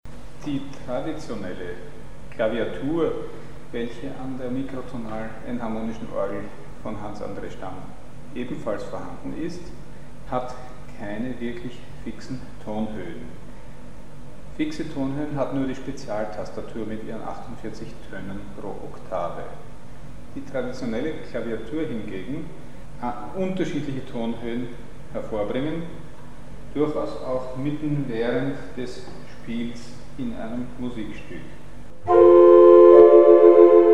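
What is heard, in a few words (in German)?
Wechselnde Tonhöhen bei traditionellen Orgeltasten; Mikrobuch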